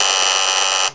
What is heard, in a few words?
com buzz, ambience; caged